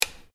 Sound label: button